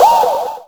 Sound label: DR. LOOP, DRUMS; X-Static Goldmine 3